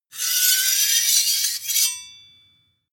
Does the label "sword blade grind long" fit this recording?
Yes